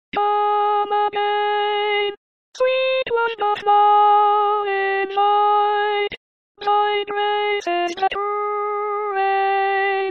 Come again Alto

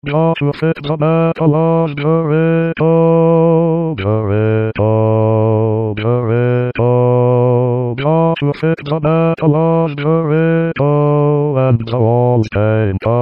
Joshua Basse staff

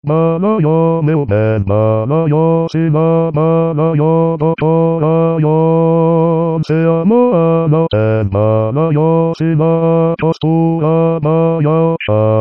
balaio Basse staff; Chant populaire Brésilien

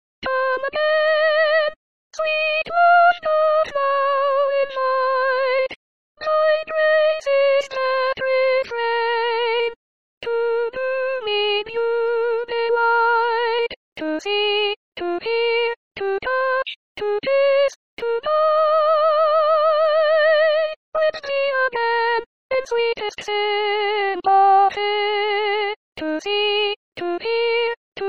Come again Soprano staff

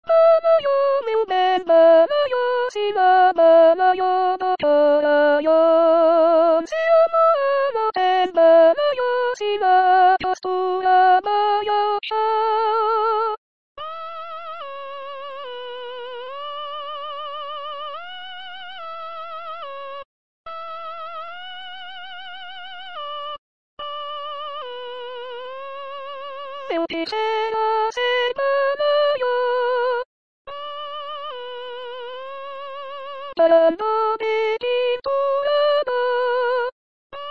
balaio Soprano staff; Chant populaire Brésilien

